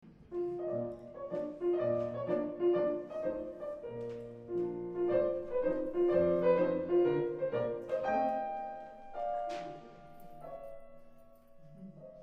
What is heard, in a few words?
Quel guardo il cavalieri, Sopran; Donizetti; Meisterkurs